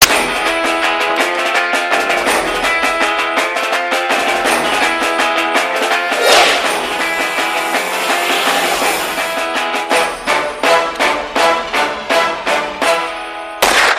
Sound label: Guitar Theme; Dabangg